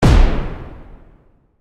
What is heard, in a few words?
Firework Boom; Fireworks